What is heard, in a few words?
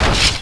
BASH; THEXDER